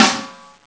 werbel

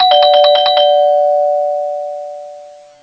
gong tony

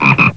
zaba

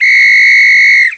gwizdek

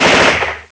karabin

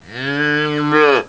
cielak

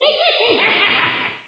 smiech

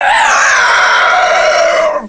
potwor

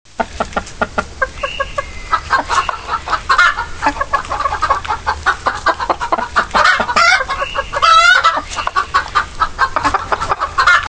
kury

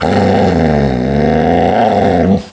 pies wark

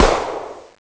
strzal

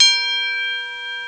dzwon

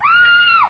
krzyk

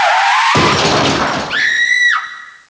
auto wypadek

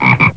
zaba

